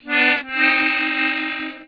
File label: death